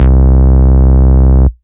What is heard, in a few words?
Ultimate Bass Root C; Vengeance Samples